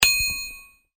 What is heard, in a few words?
ding